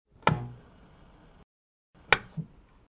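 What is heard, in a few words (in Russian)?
звук вкл и выкл тв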